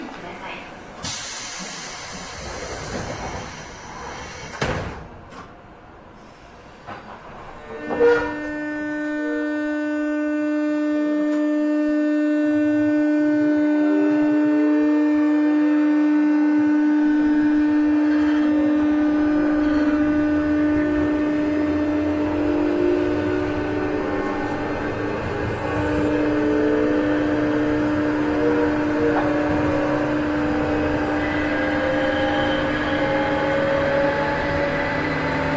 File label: chuo rapid 201